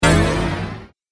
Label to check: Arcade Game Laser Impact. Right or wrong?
Right